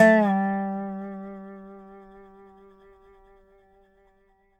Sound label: dobro; DOBRO DOWN; Country